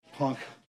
punk

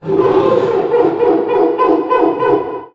great jaggi call distant

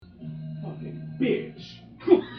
email question; outlook

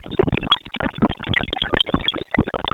corruption sound; leapster

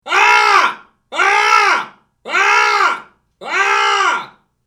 egg sounds angry

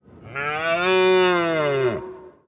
deer

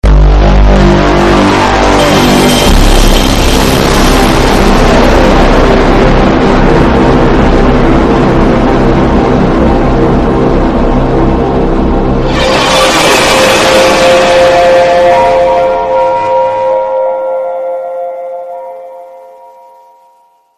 ps1 loud startup sound

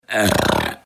burp

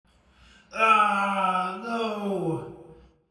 death sounds

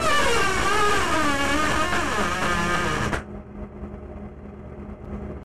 turning left sound

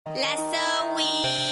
trap; spain

